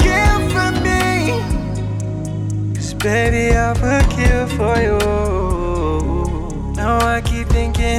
RnB Original

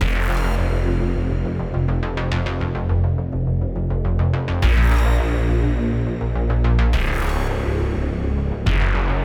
Bass Original

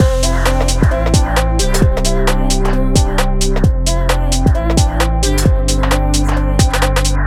Garage

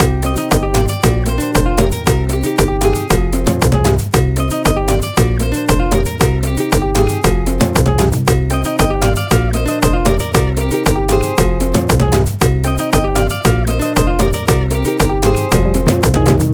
Cuban Before

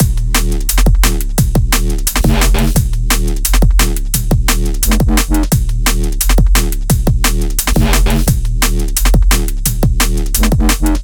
DNB Master

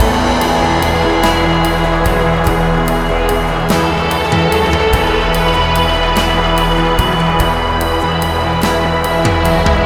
Rock

